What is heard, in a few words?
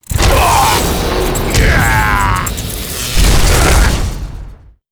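death; Colton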